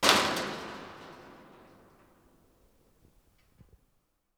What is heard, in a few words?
Metal Fence Strike; 99Sounds; The Warehouse